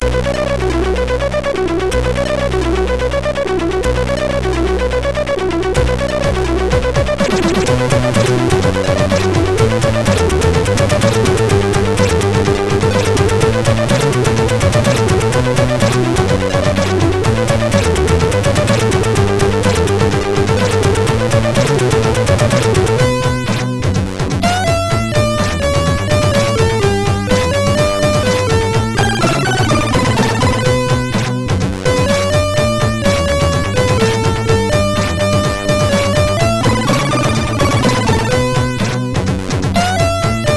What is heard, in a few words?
Celebration tune, MOS6581R4; Celebration tune 03